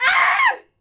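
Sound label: Horse Archer Die Vox; Civ4 warlords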